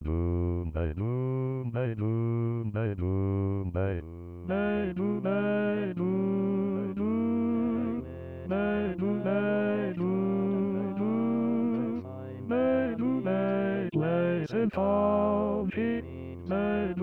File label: Baritone All Parts 120bpm